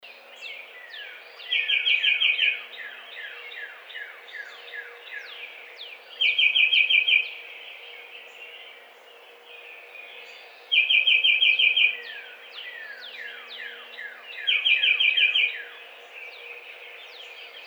Tufted Titmouse

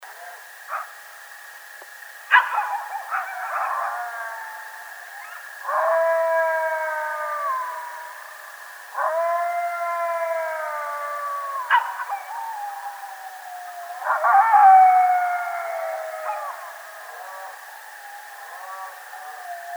Illinois Howl; bigfoot

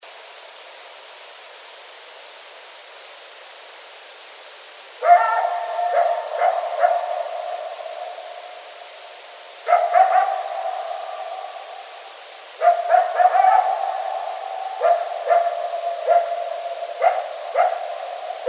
Colorado Howl, bigfoot

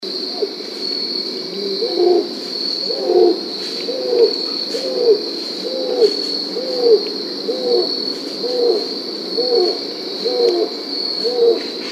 Cattle

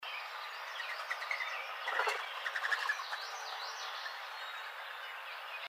Mourning Dove, birds

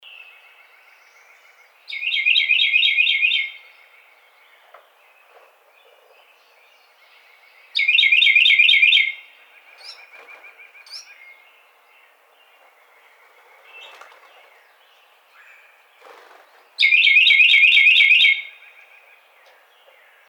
Carolina Wren

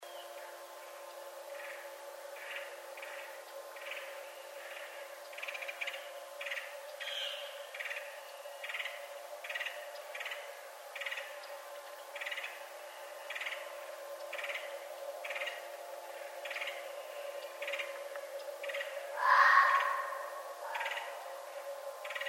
Red Fox

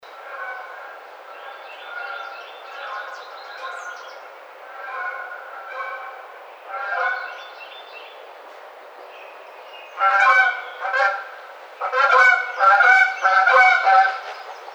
Canada Goose

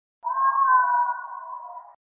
voice, bigfoot

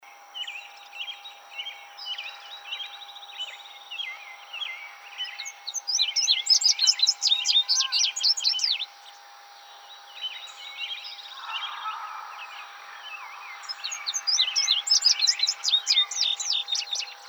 Indigo Bunting

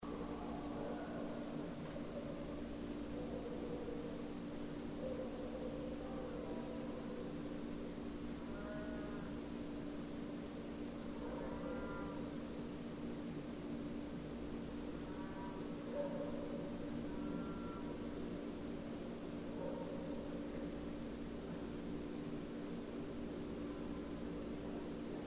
Coyotes; Coyotes (Canis latrans)

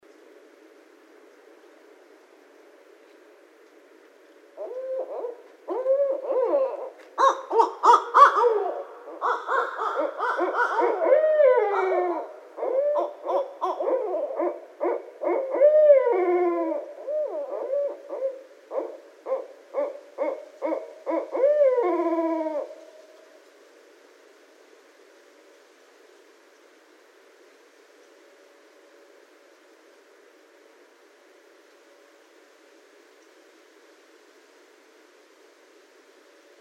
Barred Owl

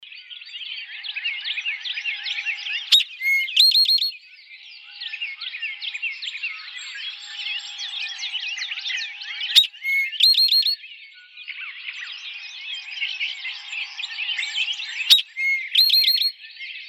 Eastern Towhee

